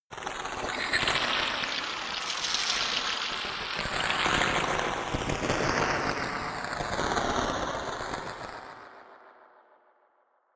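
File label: water feature, science fiction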